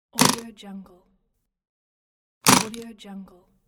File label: vintage suitcase latch opening